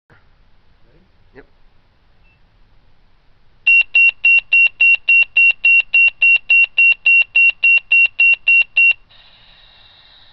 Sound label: Tone E, Watchdog Alert; OSI